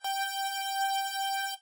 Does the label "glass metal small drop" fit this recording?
No